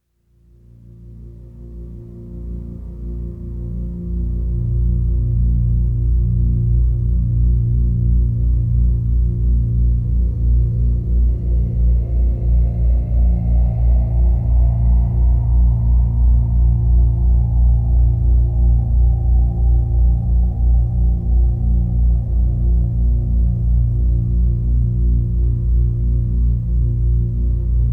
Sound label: Music + Brainwaves; relaxation